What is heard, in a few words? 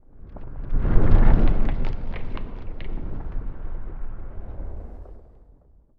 ice asteroid; ambient; Stellaris